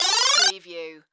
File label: Multimedia Chime